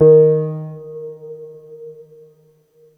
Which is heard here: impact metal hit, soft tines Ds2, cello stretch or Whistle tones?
soft tines Ds2